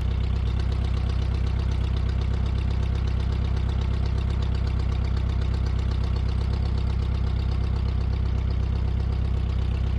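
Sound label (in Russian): авто Volkswagen двигатель на низких оборотах запись снаружи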